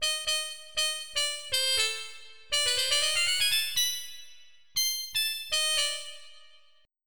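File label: muted trumpet; Roland - An Invitation To The Roland World Of Music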